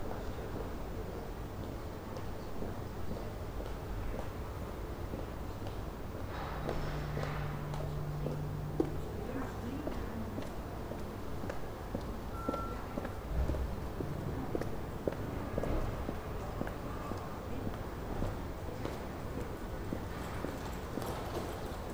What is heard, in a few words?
Calm Town Ambience